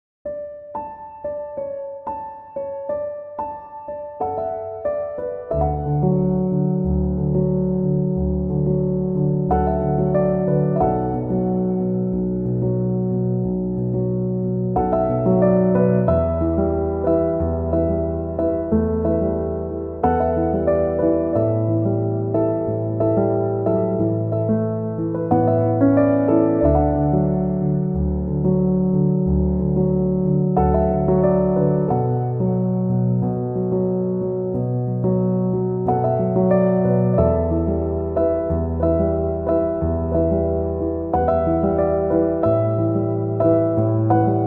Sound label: piano instrumental